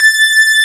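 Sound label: Synth High Strings A5; Multisamples; Digital Sound Factory Xtreme Lead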